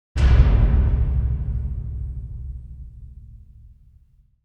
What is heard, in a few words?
metal reverb hit